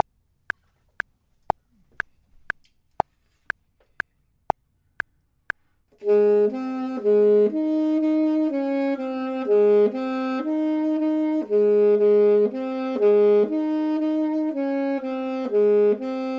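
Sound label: bottom, Saxophone and Clarinet; voxman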